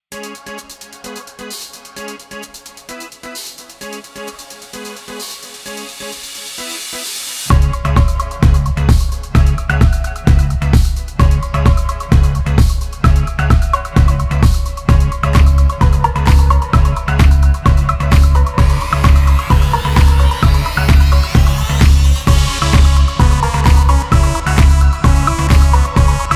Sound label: Energetic Beat Short Music